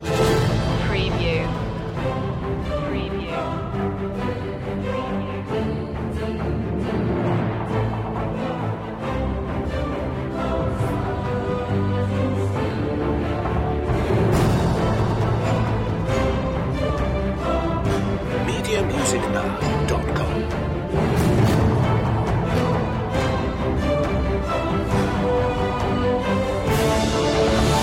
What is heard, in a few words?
with choir and solo voice